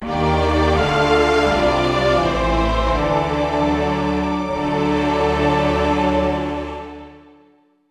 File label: Appearance Fanfare; Metroid Prime 2 Echoes Original Soundtrack (GAMERIP); Samus Aran